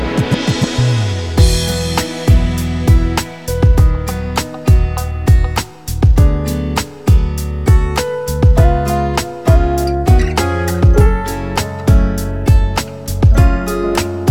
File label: Instrumental